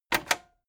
cassette eject click fast heavy

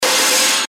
hi freq sharp noisy blast

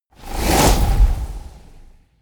whoosh flutter

